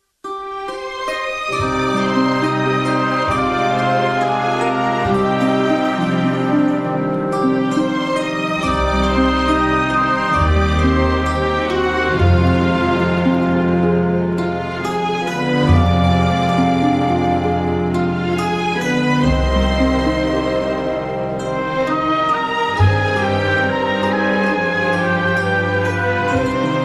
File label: Soundtrack; Le Parrain